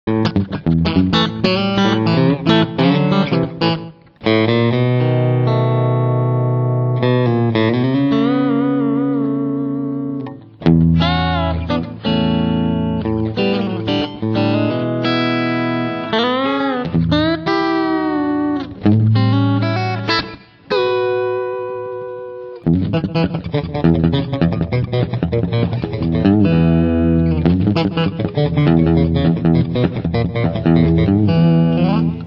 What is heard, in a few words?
Dualtone Cut Country, guitar